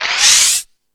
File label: Multi FX